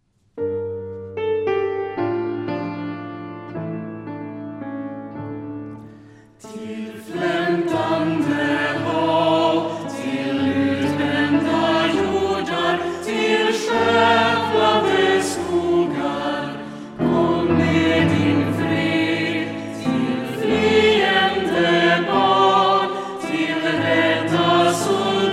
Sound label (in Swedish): kom med din fred, motettkor, korledare och pianist; umea stads motettkor; psalm om fred